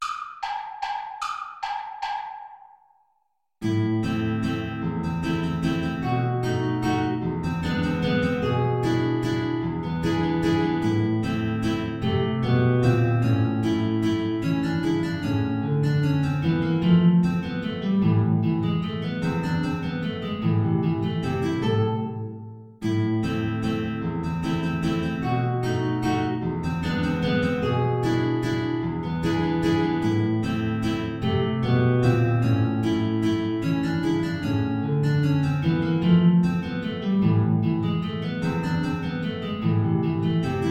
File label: traditional pica pica play along without trumpet